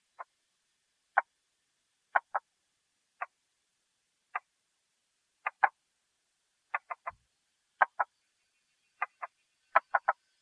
布氏樹蛙

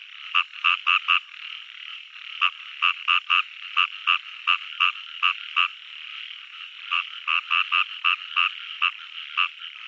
澤蛙

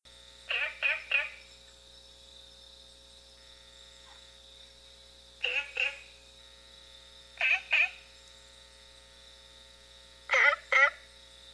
腹斑蛙, frog

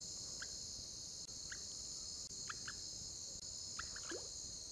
金線蛙

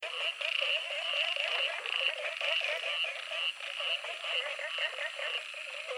巴氏小雨蛙

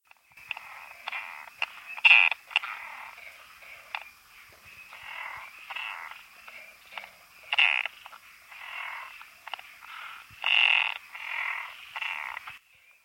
拉都希氏赤蛙, frog

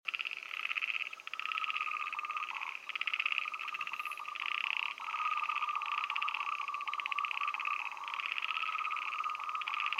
黑蒙西氏小雨蛙及莫氏樹蛙

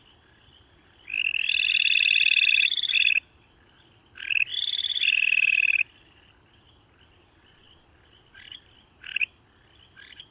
日本樹蛙

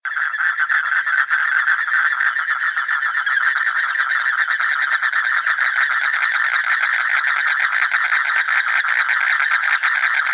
黑眶蟾蜍

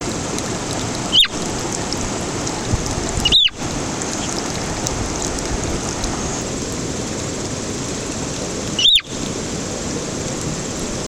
斯文豪氏赤蛙

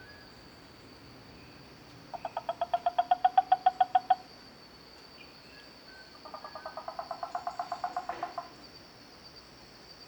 豎琴蛙

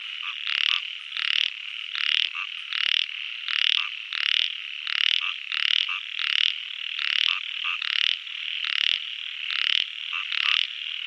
小雨蛙

